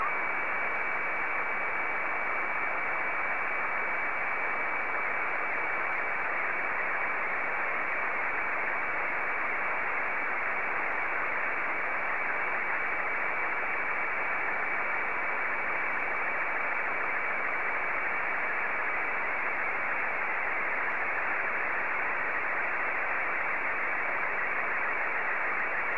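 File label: SSTV, Martin 1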